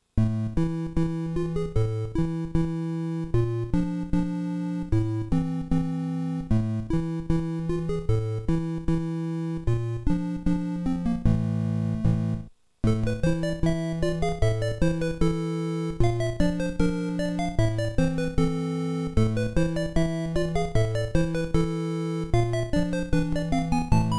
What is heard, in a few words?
shopping, Mockingboard; Apple II; Sweet Microsystems Mockingboard; Ultima 4 Phasor